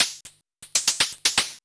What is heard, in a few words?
POLYMOD; AMG - Vince Clarke Lucky Bastard